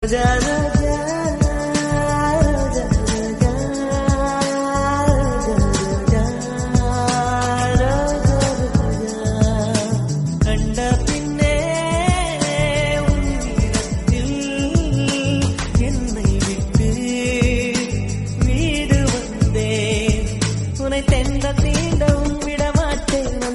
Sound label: song bgm; roja movie